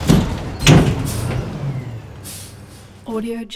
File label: industrial garbage truck; soundmorph